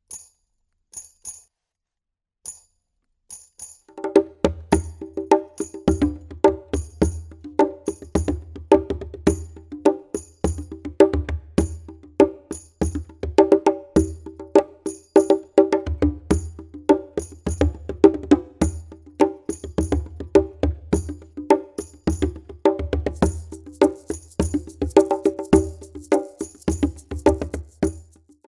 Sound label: body drum